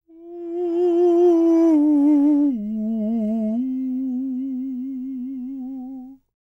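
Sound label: falsetto, Vocal Planet Jazz FX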